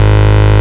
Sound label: Ultimate Bass G# Root G#; Vengeance Samples